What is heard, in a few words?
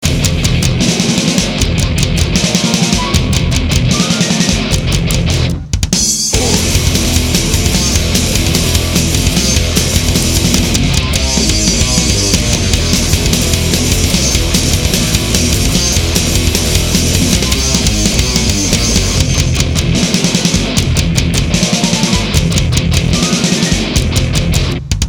beat